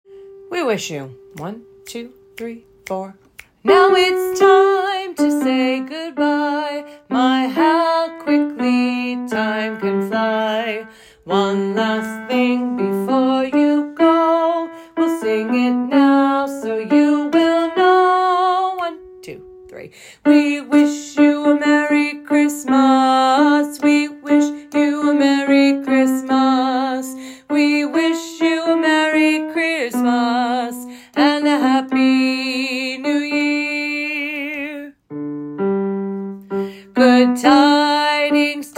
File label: We Wish You Alto; 2022 Winter Music